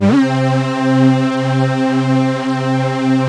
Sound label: lead synth